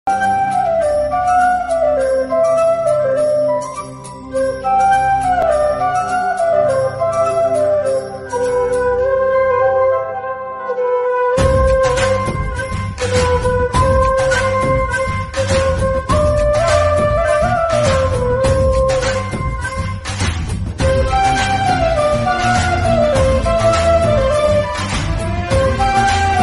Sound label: hindi bollywood song ringtone instrumental ringtone